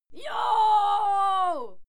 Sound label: female cheer bawl; schreie; menschen